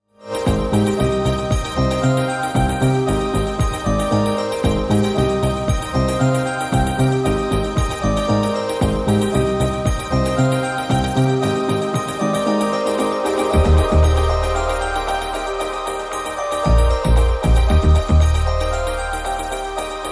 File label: chime